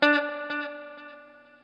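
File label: NOODLE SHORT, Guitar & Bass; Quantum Leap; 90's Sample CDs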